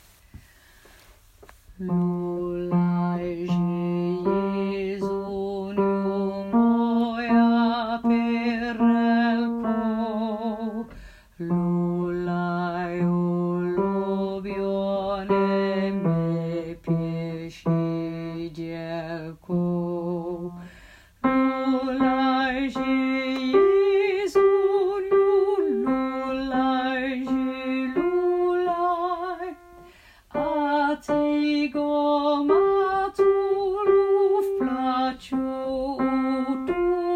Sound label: Bas lulajze